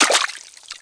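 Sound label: footsteps water; Spearhead Demo; MacFormat 2003 June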